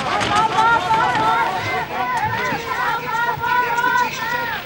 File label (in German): abfahrt anfeuer; SkiAlpin2005